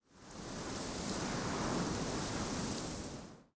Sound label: Forest Open Glade Wind Gust Dry Grass, Ambience, Day